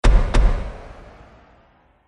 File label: Trailer Hit Double Hitter